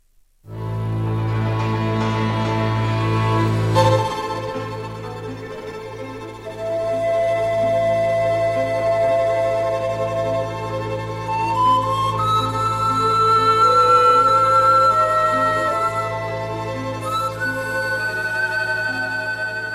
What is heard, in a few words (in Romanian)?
Doina & Sirba, Roumanie